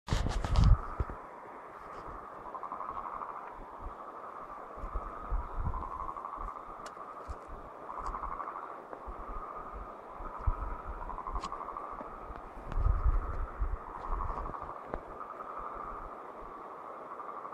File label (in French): Son grenouilles